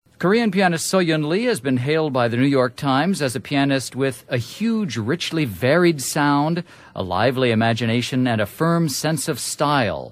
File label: Piano Solos; Chicago 2010